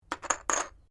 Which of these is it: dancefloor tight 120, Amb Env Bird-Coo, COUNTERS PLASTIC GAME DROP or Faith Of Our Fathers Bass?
COUNTERS PLASTIC GAME DROP